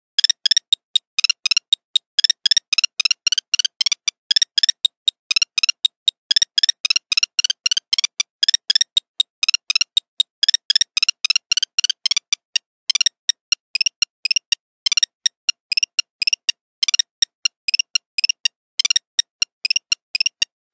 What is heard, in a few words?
1100 , Nokia Buzzer; Windows Phone apps